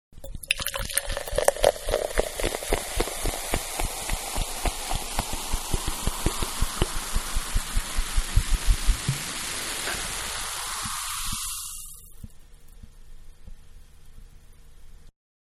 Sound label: Bottle 2 Liter Pour Into Glass; Pouring; Objects; Manhattan Production Music